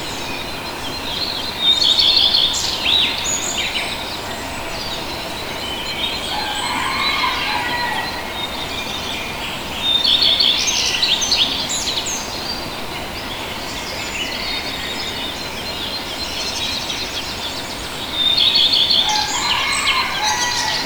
early mountain birds habitat; soundscapes

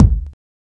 reverb bass drum single hit a sharp key

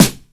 crunchy snare sample f sharp key

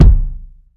short reverb kick sound b key

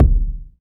short reverb bass drum sample D# key; kick

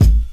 boomy bass drum one shot A key; kick

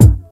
trebly kick drum sample g sharp key

